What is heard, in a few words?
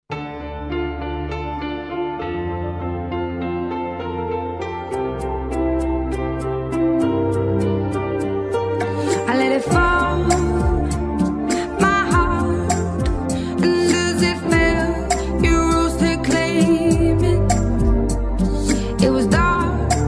Ballroom Rumba, 24-27 bpm